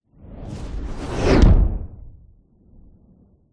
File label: fantasy magic spell dark whoosh into thud